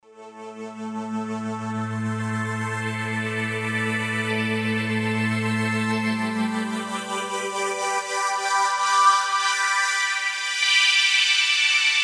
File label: Synth Odyssey,Roland SRX-07 Ultimate Keys